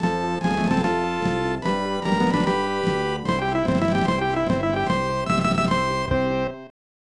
Daiseikou Fanfare; Super Momotarou Dentetsu DX; SNES

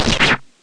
powerful energy gun

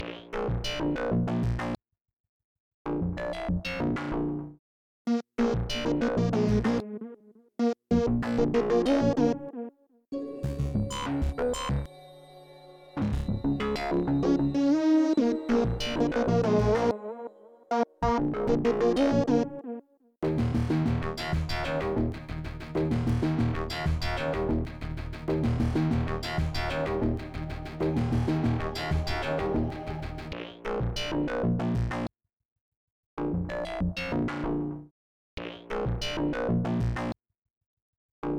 95bpm Lead only Loop; gamerip; Bakugan: Champions of Vestroia

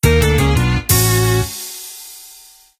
Battle Lose

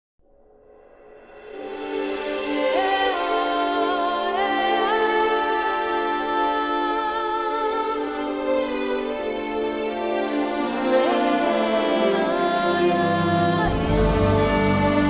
Abandoned Places Jingle; Toussaint; Living World

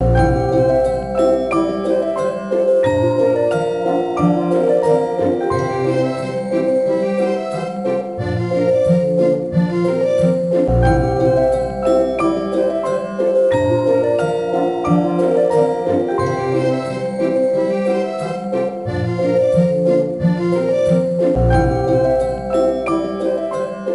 Music Ring Toss; Six Flags Fun Park 2008 nds gamerip

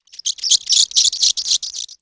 Spawn

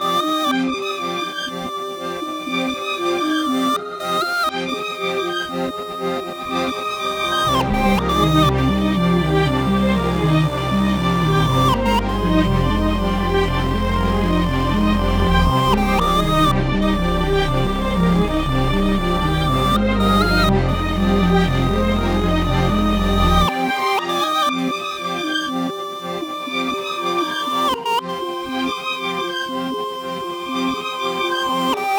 section02 reverse loop 120bpm

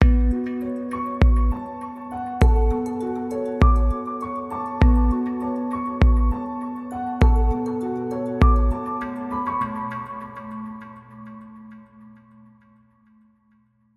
boss interlude 100bpm; 16beats; chicory a colorful tale; ps4

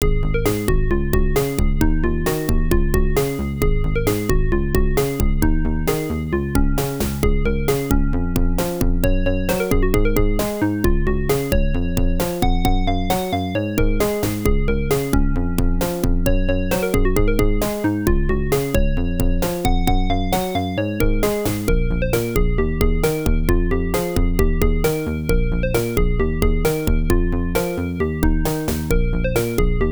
London Bridge Is Falling Down; Lemmings; PC-98

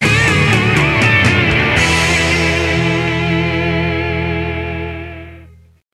Stage Clear; Rally de Europe; psx; Car Unlock

